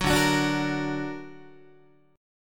D Augmented D down Guitar; D+ strummed; JGuitar

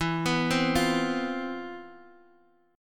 C Major 11th E

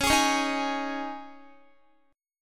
Db Suspended 2nd, Guitar; strummed; x,16,18,18,15,15; 4E,4A,5D,5G,5C,6F; Dbsus2

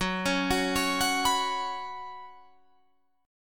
Gb Suspended 4th Gb, down, Guitar, Drop D

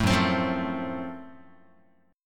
F sharp Minor Major 7th Flat 5th, Guitar; strummed

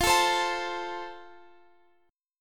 F Major, Guitar Open F; strummed

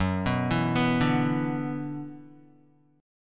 F sharp 7th Suspended 4th Sharp 5th, Guitar; F#7sus4#5 arpeggiated

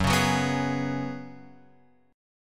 F sharp Suspended 2nd Suspended 4th; F#sus2sus4; {2 4 4 4 X 4} strummed

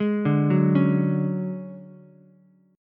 D Major 7th Flat 5th D down Ukulele; arpeggiated; JGuitar

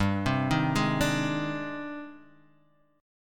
G sharp Major Sharp 11th G, chord

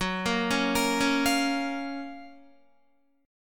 Gb Major 7th Gb Guitar; GbM7 arpeggiated; JGuitar

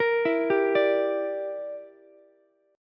Bb 6th Flat 5th, Ukulele; Bb6b5 arpeggiated

